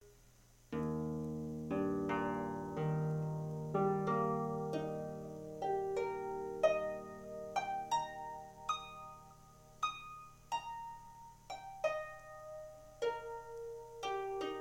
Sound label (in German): Harfen Es-Dur; Harfenforum